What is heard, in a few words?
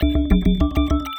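100 BPM; RHYTHMLOOPS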